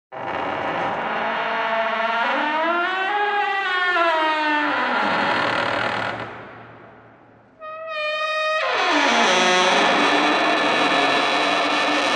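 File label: Long Metal Hinge Squeak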